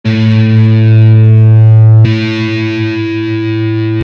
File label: Guitar notes